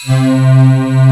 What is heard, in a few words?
LINEAR SYNTH